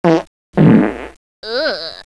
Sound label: fart sound; hl2dm